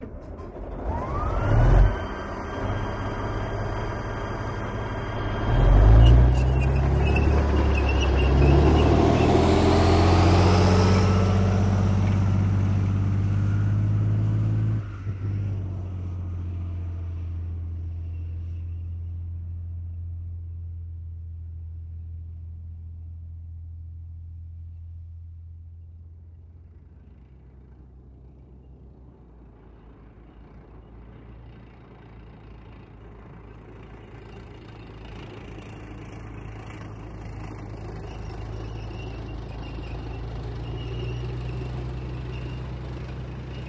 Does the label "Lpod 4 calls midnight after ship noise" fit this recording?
No